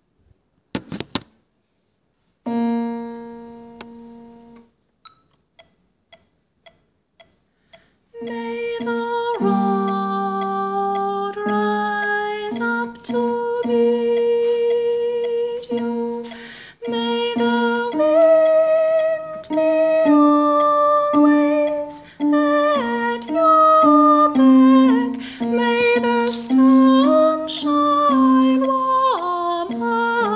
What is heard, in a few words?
May the road Tenor